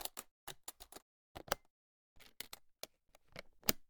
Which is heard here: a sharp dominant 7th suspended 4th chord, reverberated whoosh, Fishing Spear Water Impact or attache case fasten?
attache case fasten